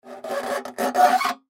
Scrape Metal Grind, Foley Scrape Metal Grind Squeak; Materials; Blastwave